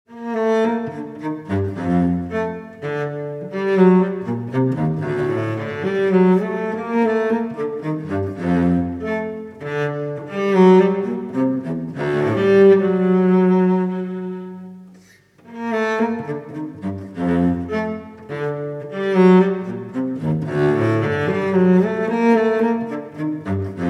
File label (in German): Streichinstrumente Minuet II